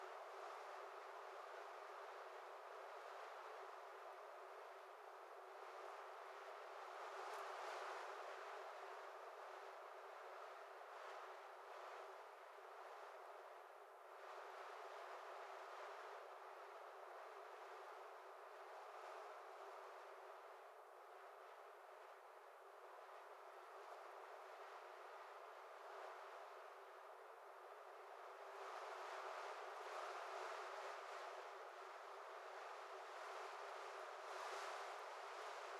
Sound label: wind thin; ambient; dust2